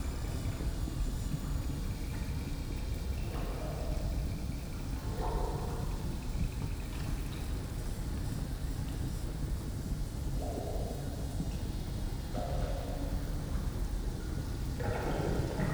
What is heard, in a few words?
atmos swamp; Hollow Knight: Silksong